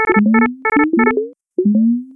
Oxygenation HP, alarms; J Edworthy 2019

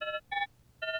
Oxygen Caution, alarms

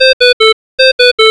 oxyvhi, alarms

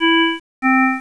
genlow, alarms

